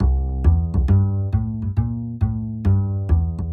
Blues Bass Walking Upright C

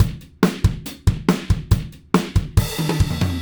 Drums Rock Fill, loops; Alex Sanfilippo

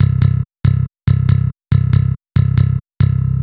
E Bass; Jazzy Bass

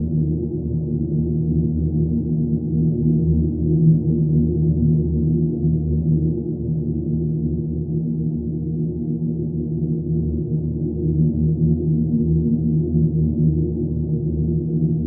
100 Eb Bass, Ambient String Loops; Luxe; Diffraction

